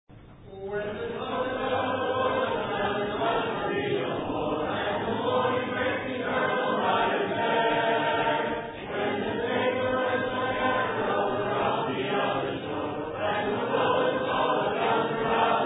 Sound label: a capella hymn, when the roll is called up yonder